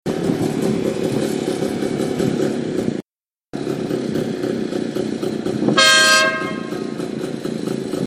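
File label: horn comparison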